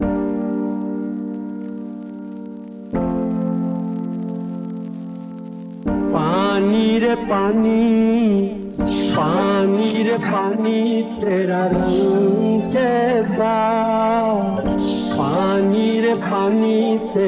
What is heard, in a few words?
lofi flip